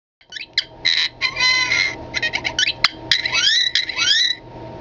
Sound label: r2d2 sound; swsaga